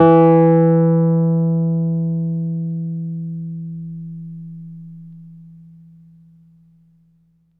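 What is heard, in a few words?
E PNO E2 MF; Synclavier Sampler Library; KEYS AND GUITARS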